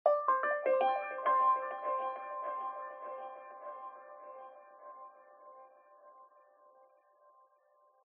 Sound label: game win